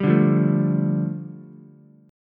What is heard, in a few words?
G 7th Suspended 2nd, Ukulele; strummed; JGuitar